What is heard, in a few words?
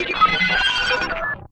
potato powerup; playonce scripted sequences; Portal 2